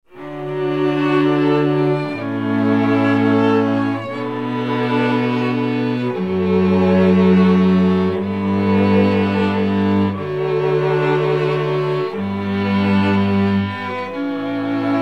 Canon in D; Pachelbel; Soundzabound

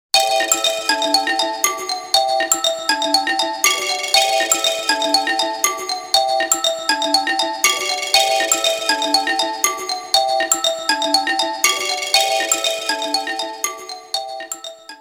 Xylo Trans; Soundzabound

